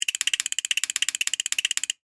Steampunk Robot, Scifi, Steampunk, Robot, Mechanical, Spider; Science, Fiction; BlastWave